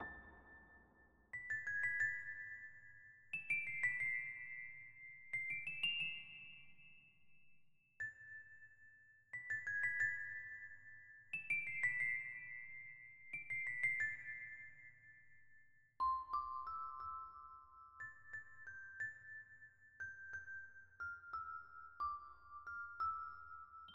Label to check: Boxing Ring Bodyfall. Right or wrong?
Wrong